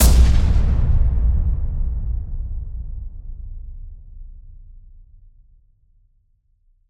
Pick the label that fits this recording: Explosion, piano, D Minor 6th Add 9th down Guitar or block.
Explosion